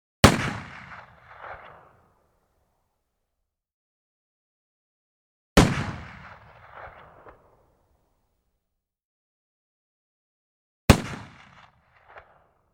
винтовка Браунинг 4570 Выстрелы

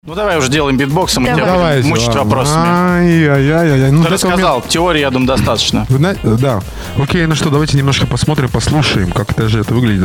Битбокс beatbox радио; Шоу Вечерний Завтрак

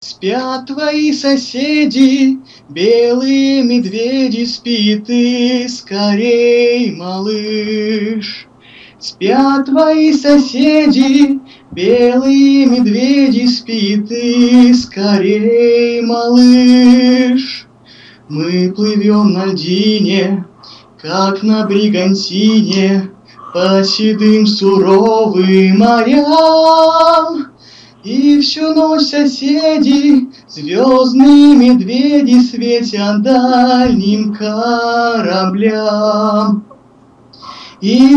Колыбельная для феечек; феечки

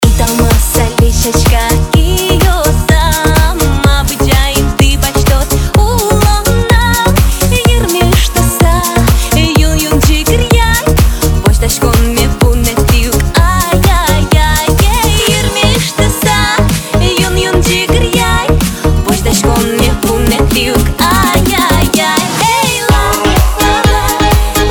Танцевальная Удмуртская Музыка

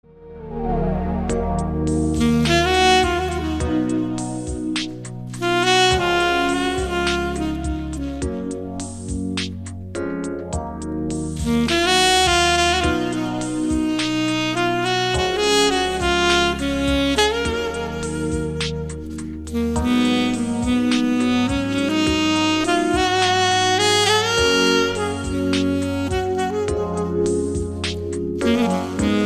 Романтическая красивая инструментальная музыка; свадебный танец

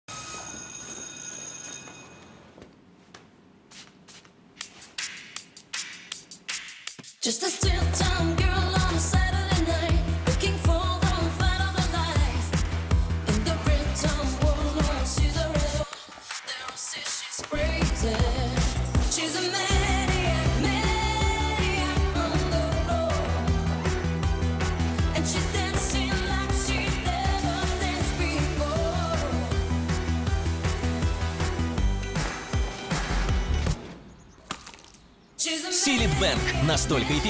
Музыка из рекламы; Cillit Bang Уборка одно удовольствие 2016

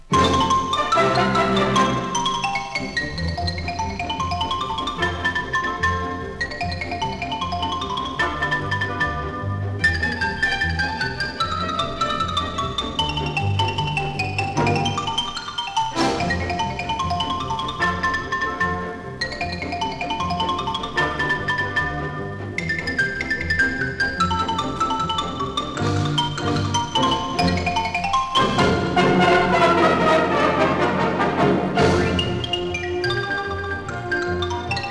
ксилофон; ксилофон (Польша)

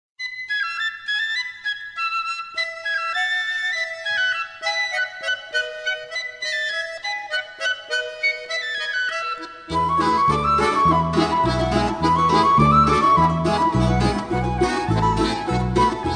Приокские наигрыши (минусовка); Я с комариком плясала

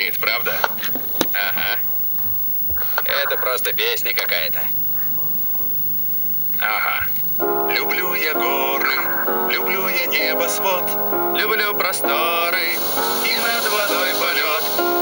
Песня из рекламы; Discovery TV

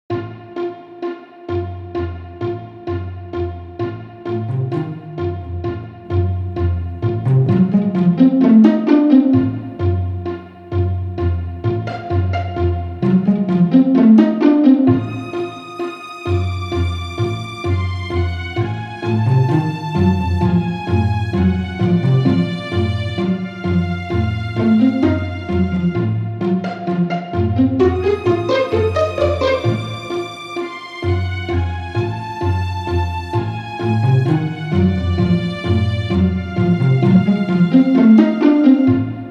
Sound Track к аудио книге Напряженная тема; PiTone Sound

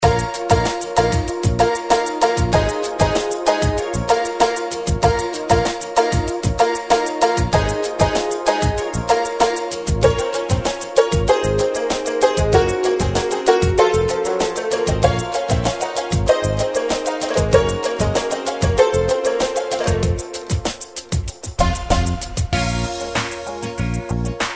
минусовка аранжировка

